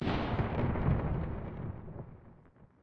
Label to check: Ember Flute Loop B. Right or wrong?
Wrong